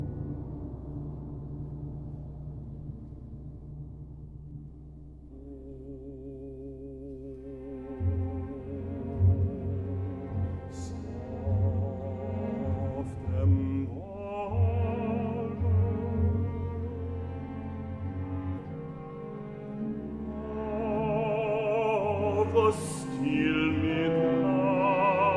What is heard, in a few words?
symphony for orchestra